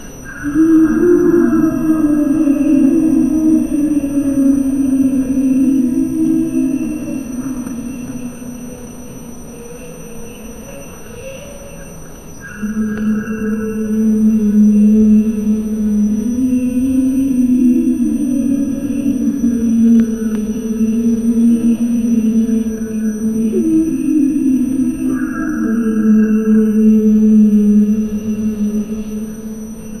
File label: gentle but eerie wind, tolling